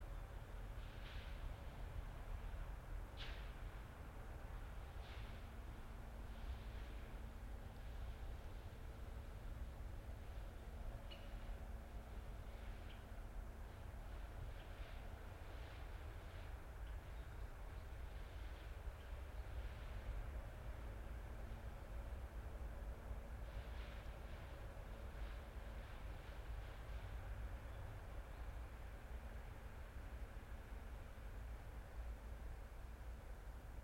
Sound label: yamanakako sound